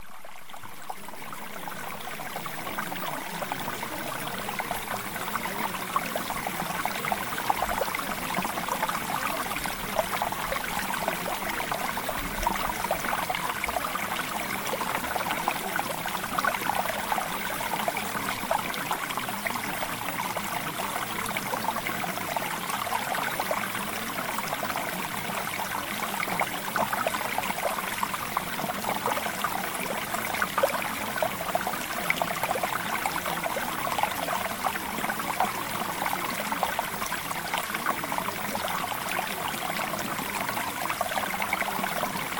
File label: Mountain Stream